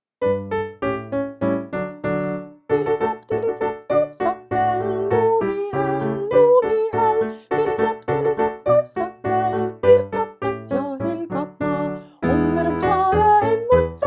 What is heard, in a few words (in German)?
klavier stimme